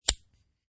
USB Retract; Sliding retractable USB thumbstick out; Computers (Sound Effects:Science Fiction)

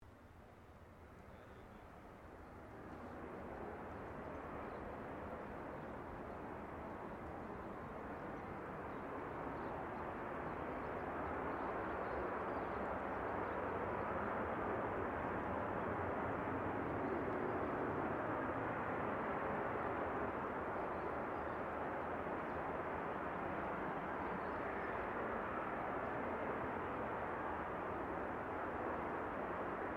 AIRCRAFT CA-27 SABRE TAKEOFF; CA-27/F-86 Sabre; Airplanes; Vehicles

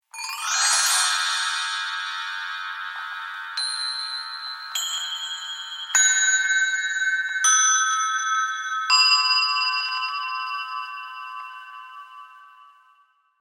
Bells Chinese Small; Musical

